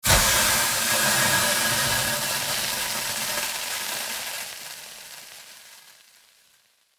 Hot pan sizzle water hiss; kitchen

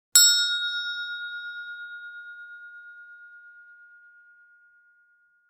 Handbell single ring

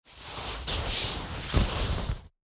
Silk bag manipulation material

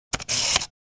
Camera movement lens focus zoom

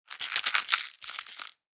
Pill container shaking pills inside; medicine

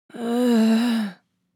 Woman light moan weak or pain

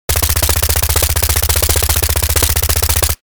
Sterling submachine gun full auto fire; war

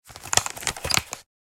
Brain teaser solve puzzle solution; leisure sound effects

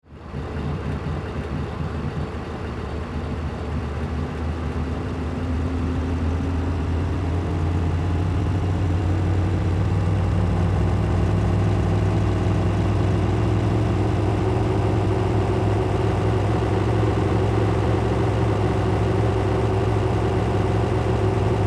Industrial semi truck moving on the road loopable

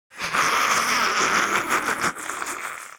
Demon beast imp monster maniacal laugh

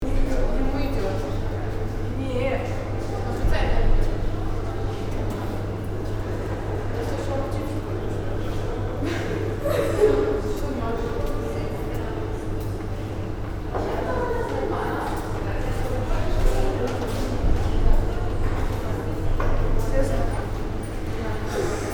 School hallway light students chatter loop

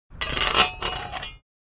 Garden shovel dragging scraping on concrete

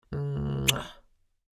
Mature female short sexy kiss

